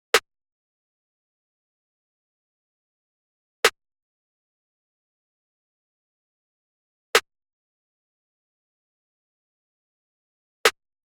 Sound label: Snare A 137 Bmaj